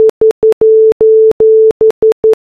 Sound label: SOS morse code